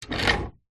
household radiator portable wheels roll very short wood floor